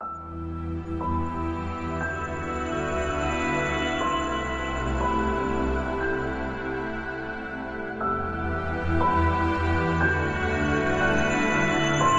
Welling Ambient

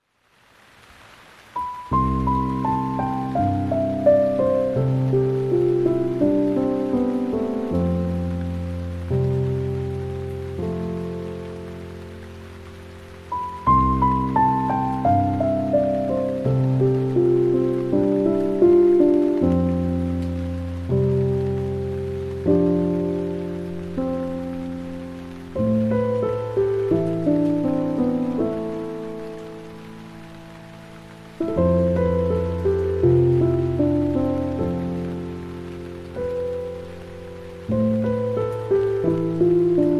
Piano, With Rain

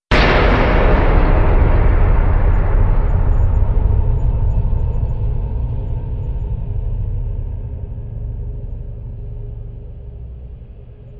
Deep Implosion; Epic Textures vol. 2

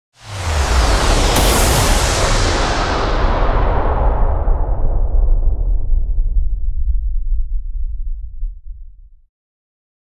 Swooshes (Explosive); Epic Tools vol. 1

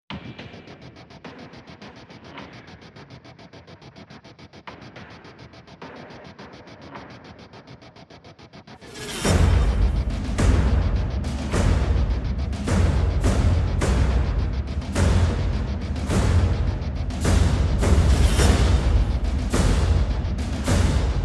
Perc and Pulses; Brand X Music

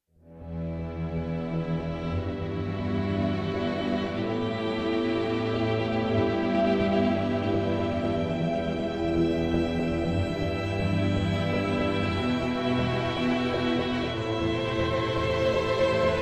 Distrust Ambient

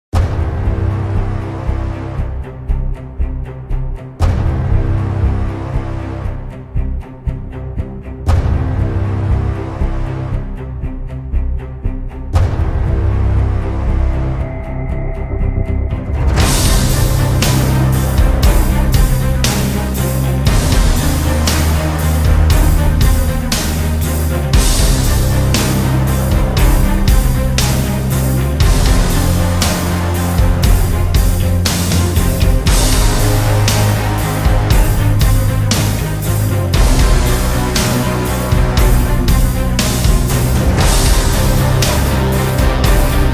No Vocals

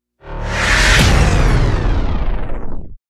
Vision Hit Downer; Ninja Tracks

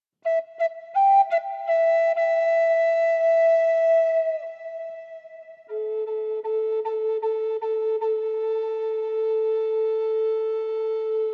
Massage Flutes

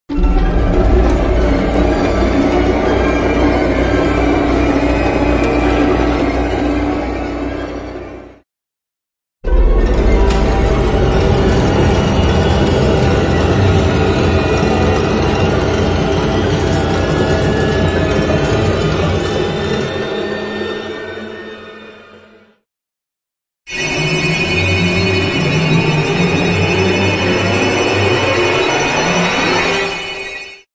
Orchestral Insect Clusters; Epic Tools vol. 1

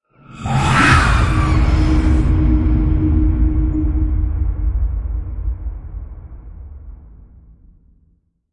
Spectre Scream; Ninja Tracks

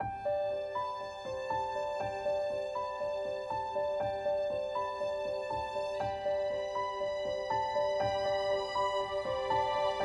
Gliding Ambient

